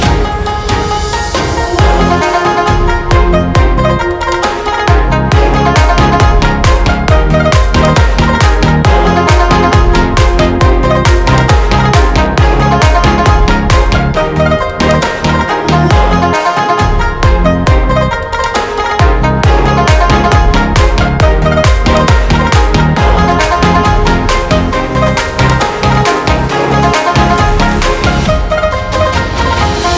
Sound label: Remix Instrumental